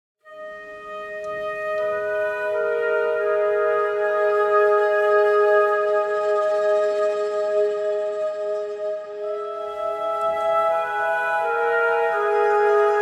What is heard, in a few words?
flute warm; Muzyka Ilustracyjna